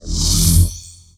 fizzler shutdown; Portal 2

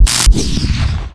electric explosion, labs; Half Life Model Pack; Half Life 2; ambient levels

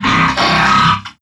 dry, strider, combat, movement grunt

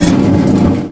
pontoon scrape rough, airboat; Half Life 2; vehicles

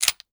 hkp2000 slide back; weapons; Counter Strike Model Pack; CS2

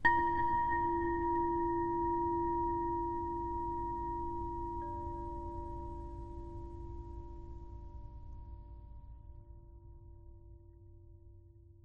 ambient abstract chimes low; Half Life Model Pack

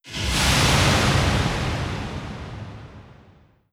explo reverb; creatures; combat; strider; seq death

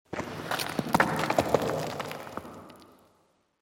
cavern excavation rockslide; ambient, rocks

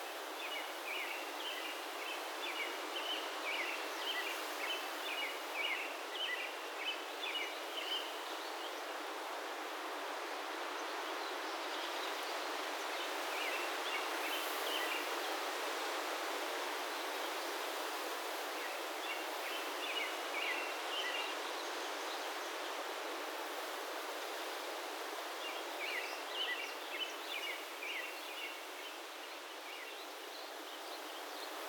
exterior; ambient; inferno

